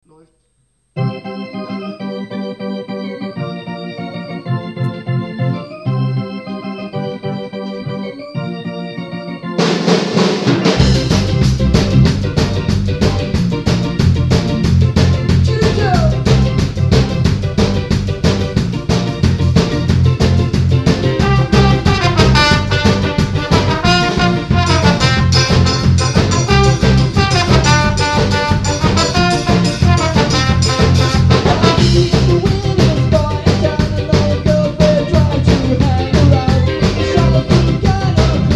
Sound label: Polka